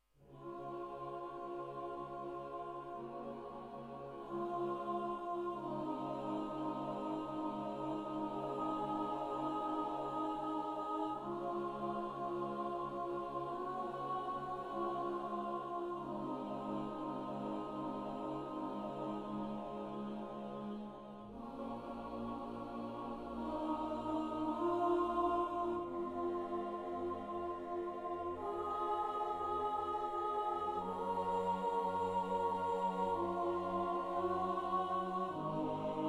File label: Choir